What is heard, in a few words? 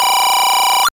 Rupee Grass